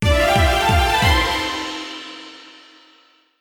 Win; genesis; euro Golden Cup Desktop

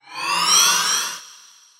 respin windup; medallion Desktop; Fantasma

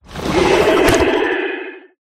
bonus giga melee; Recode; rampage riches

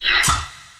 single block slide; medallion; Fantasma

